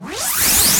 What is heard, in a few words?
Laser Rev Up